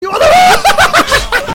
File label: uuhhahaha